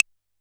Analog Claves; Yamaha PSR-E323; Dance Kit

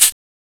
CABASA, FAIRLIGHT CMI IIX, PERCUSN; PERCUSN1

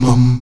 VOX MUM B 2C, Afro Chants; Roland LCDP11 Africa VOL-1; Stacc Mums

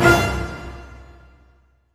HIT, Orchestral; Orchestral Loops; 128 STRAVNSKY

